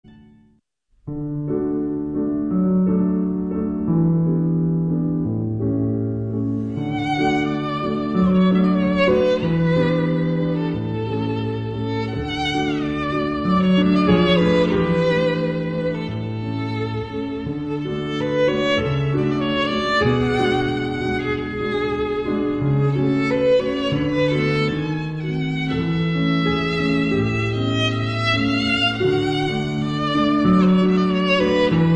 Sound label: Cavatina for Violin and Piano, piano